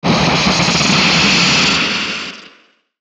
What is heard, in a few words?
Ascend Static Hiss Warp Warble; Blastwave